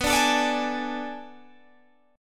B Minor 13th B down Guitar Open G

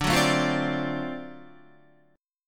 D 7th Sharp 9th D down Guitar; strummed; JGuitar

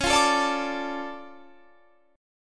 D Minor Db, chord; Dm/Db strummed

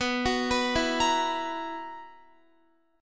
B Major 7th Suspended 4th, Guitar chord; BM7sus4; arpeggiated; x,14,16,16,16,17; 4E,4A,5D,5G,5C,6F

